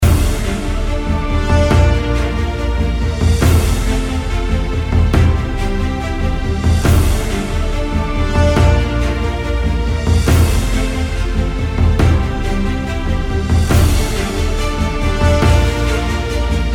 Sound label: intro music